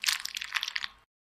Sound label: organ, surgery; Shitmed; Medical